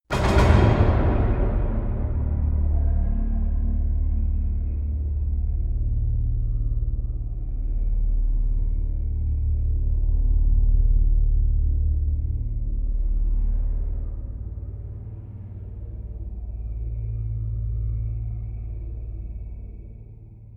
iron slams

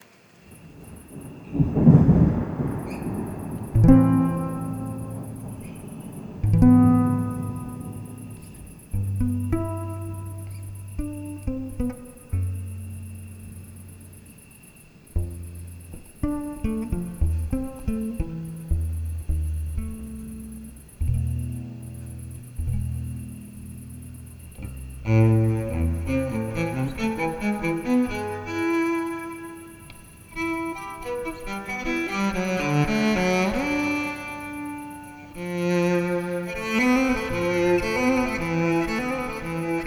cello cadenza

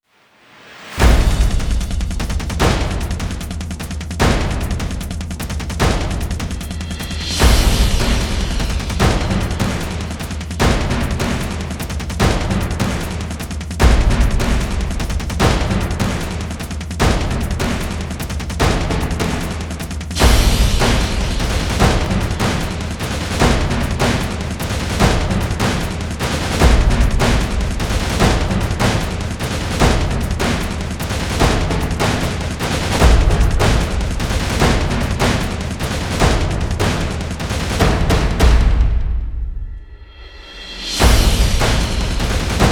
drums build

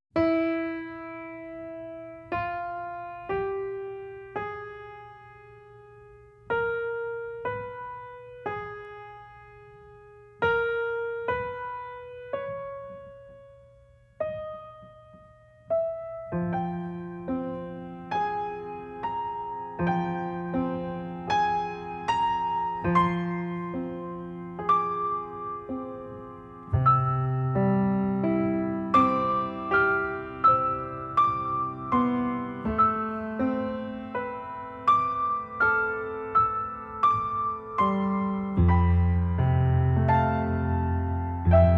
piano

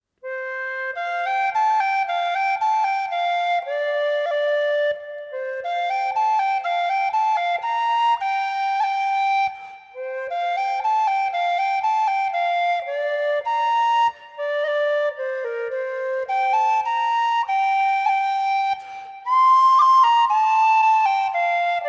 tin whistle

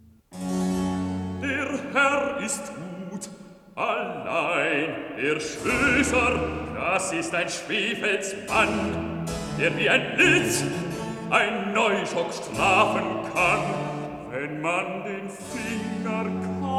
recitativo bass; johann sebastian bach; bauern kantate bwv 212; der herr ist gut

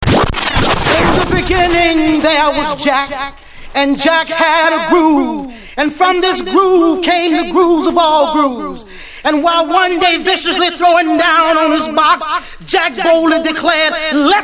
acapella house music

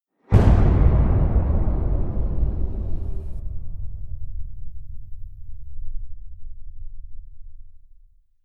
booms abyss

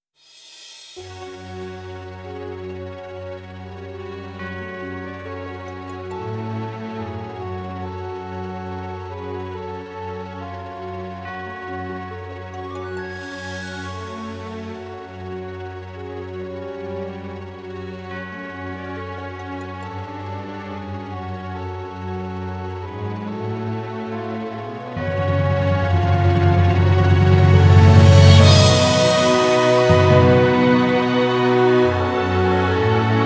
instrumental

